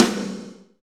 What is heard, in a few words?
SD Real, Roland; Sound & Vision - Gigapack I